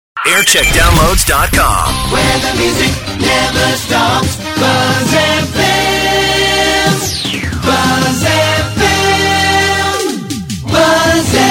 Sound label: jingle montage; buzz fm